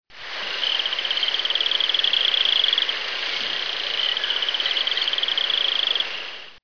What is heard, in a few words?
frog